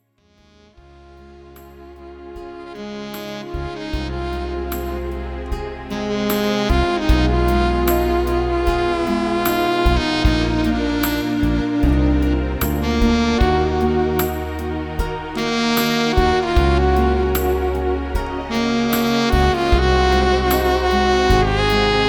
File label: sax